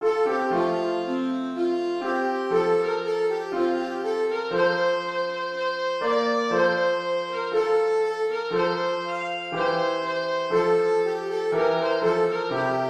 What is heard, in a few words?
rondeau alto sax